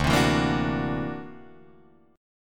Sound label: D Augmented 9th down Guitar Drop D